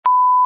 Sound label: language bleap; Test Tones; 1001 Sound Effects